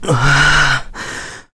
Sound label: Dizzy; Link dizzy